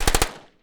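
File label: 9m colt exterior shots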